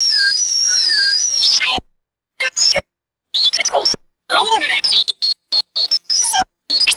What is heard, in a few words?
whiny robot whirs and telemetry beeps; robots